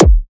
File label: Weird Kick